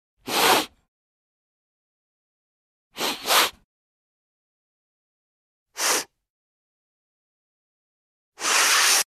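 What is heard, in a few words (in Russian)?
звуки сопения человека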